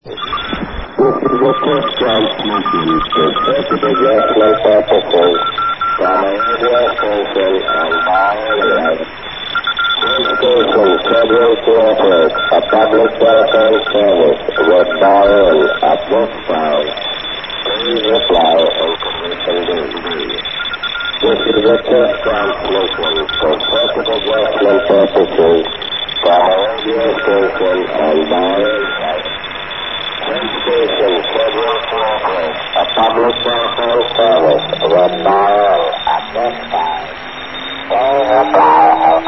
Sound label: usb; manama; bahrain; rainer brannolte, d